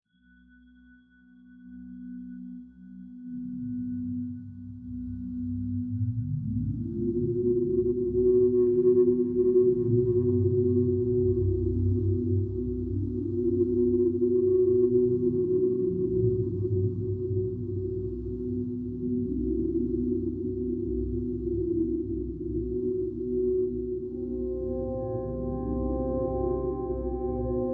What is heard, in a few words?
deep ocean echoes meditation